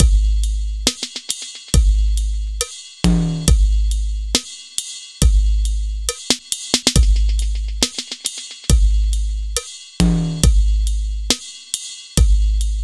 Bpm138 Dark Matter Break, dubstep drum loops

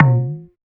Perc, Dubstep One-Shot Drums

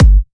Bassdrum

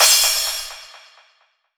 Crash, Cymbals

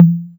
Toms

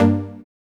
TECHNO D3, dubstep; Jack Sparrow Deep Dubstep Progressions

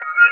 Eb STAB, funky

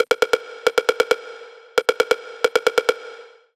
135 DRUMLOOP; Loopmasters; Booty Breaks Vol.2

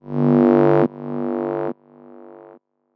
GROWLS DELAY G1, BASS; Loopmasters; Jack Sparrow; Deep Dubstep Progressions

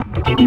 B STAB, funky

